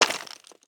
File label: stone footstep